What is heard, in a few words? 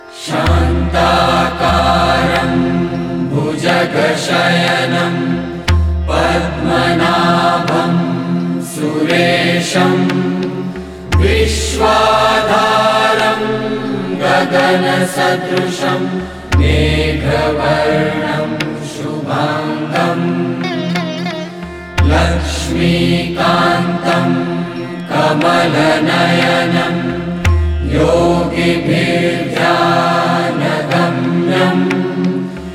Shri Krishna stuti, himalayan chants